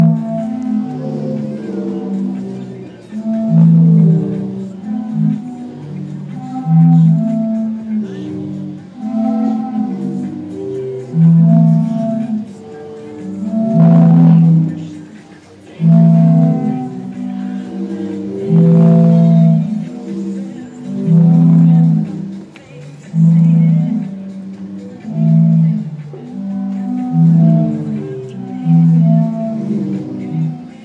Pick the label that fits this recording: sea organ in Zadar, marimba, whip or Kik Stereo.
sea organ in Zadar